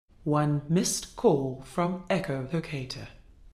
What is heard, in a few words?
SMS; PlanetX